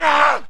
man hit; Mount&Blade Warband

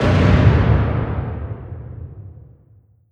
distance medium armour fire; Hearts of Iron IV Death or Dishonor

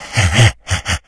become alert, infected

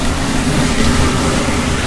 plastic box scrape smooth loop; physics; Garry's Mod; hl2

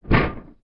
mech step; Sid Meier's Civilization 4 Complete; Beyond the Sword; Units

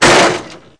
Metal Hit, collision; AssaultCube; Alien Stars; American Muscle Series

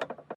click submenu; Hearts of Iron IV Death or Dishonor

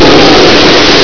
Take Off; Emperor - Battle for Dune

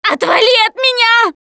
scream while pounced

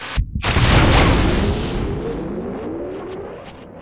teleport, machines; Garry's Mod; hl2

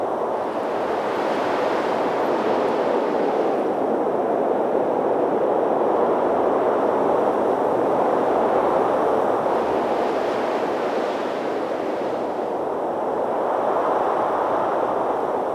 weather cloud winds; Hearts of Iron IV Death or Dishonor

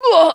woman hit; Mount&Blade Warband

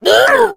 female boomer pain short; voice; Left 4 Dead 2

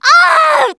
Hurt Critical, russian

